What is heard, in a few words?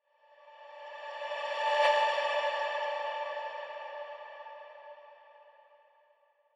Ambient Shot